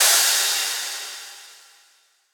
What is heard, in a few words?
Crash; Hats and Cymbals